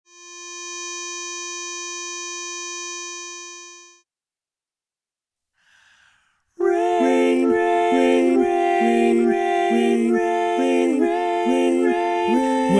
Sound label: no bass